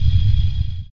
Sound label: box open